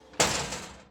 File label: Lock Gate